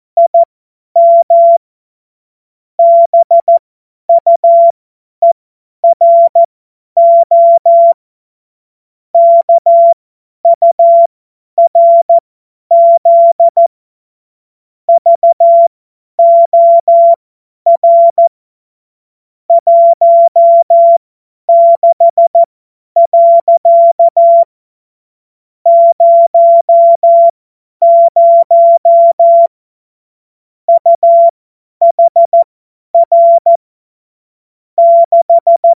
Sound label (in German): Witz, cw morsen; Tempo 50 F70